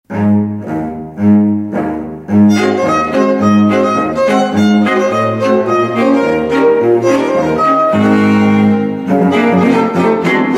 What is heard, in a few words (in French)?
jazz quatuor